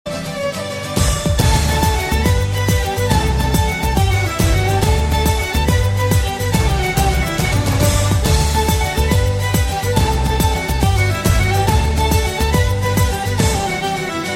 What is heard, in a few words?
Irish Music